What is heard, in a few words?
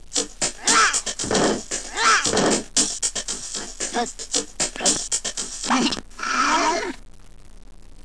Singing Grinnz